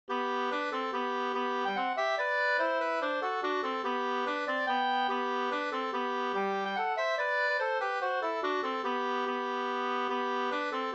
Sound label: mazurka; Fars mazurka